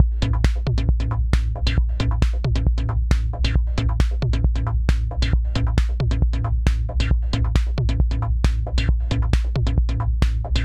Kompressor Vergleich SSL Native Comp V6

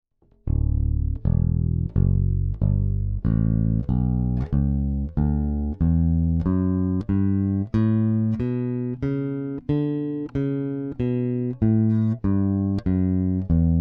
Big Blade Neck PU passiv; Nordstrand

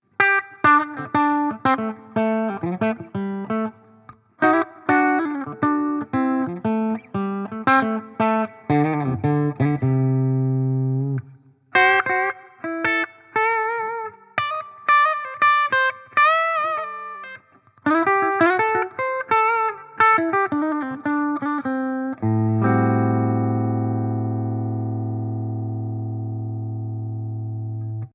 Yamaha Revstar RSS02T clean Steg Focus Switch

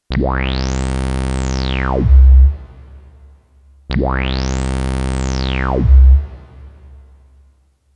LoFi 12XT Filter mit LFO

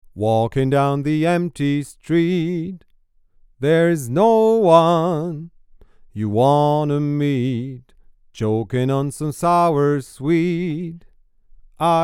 Male Vocal Niere 25 cm; Universal Audio Bock 251

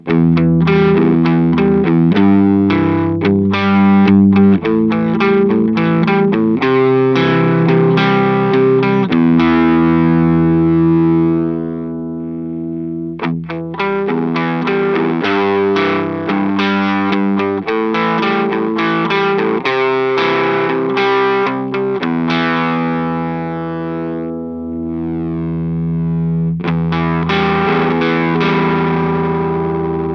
Earthquaker Devices Zoar Bass auf u zugedreht

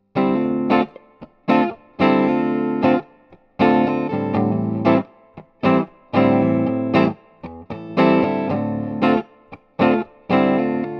Guitar Amp Kondensator Mic; Warm Audio WA-19

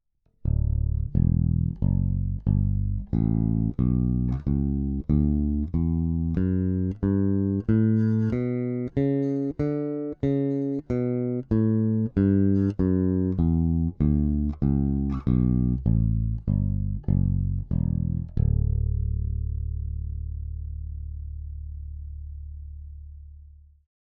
Big Blade Both PU passiv; Nordstrand

